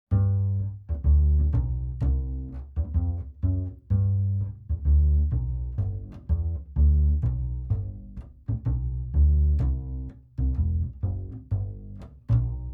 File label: Upright Bass